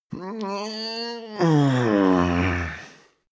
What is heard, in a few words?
male growl wake, koerpergeraeusche; soundpool